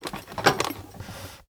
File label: foley; 99Sounds; Sound Design Tools; Raw Foley